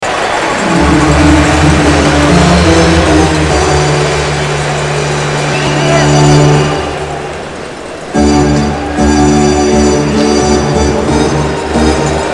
ORGAN; OLYMPIC; NHL

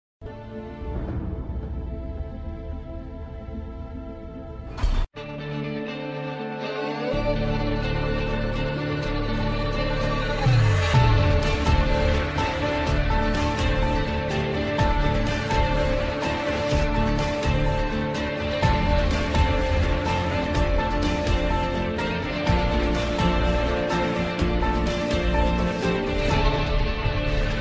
Music Theme soundtrack; Chicago Fire

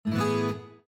windows balloon

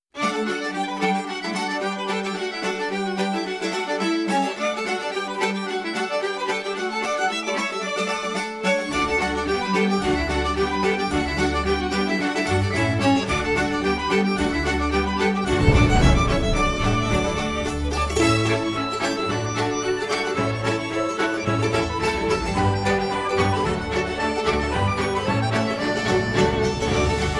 Two Hornpipes; Tortuga soundtrack; Dead Man's Chest

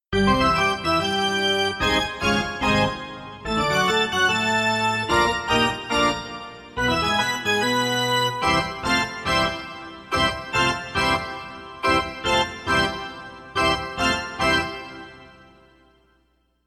Arena Organ

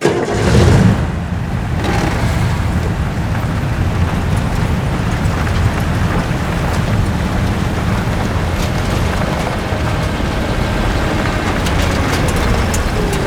Truck

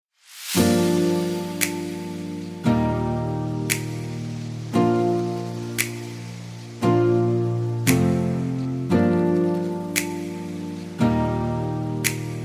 Music Waves

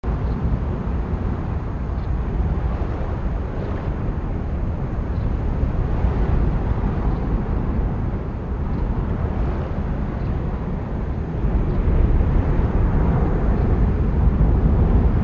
Amb Interior

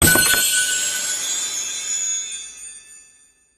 open treasure

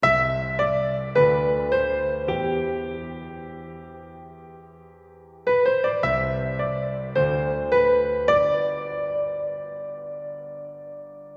Chorused Piano

